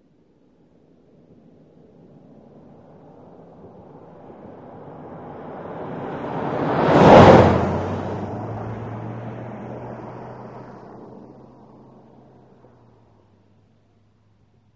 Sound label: Sm Car By 25 35 mph, compact